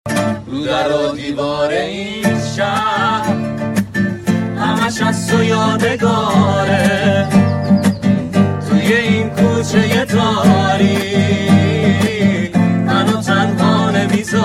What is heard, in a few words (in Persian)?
Ba Guitar